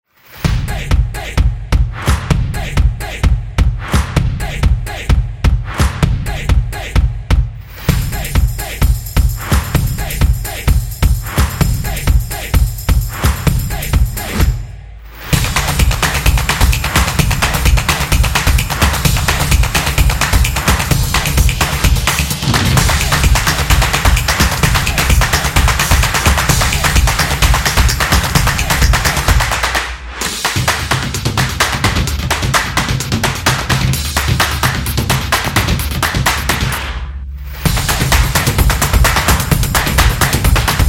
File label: Drums Fun; percussive nation vol 4